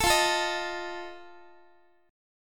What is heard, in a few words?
F 7th, Guitar; x,x,12,15,13,14; 4A,4E,5A,5D,5G#,6C#; strummed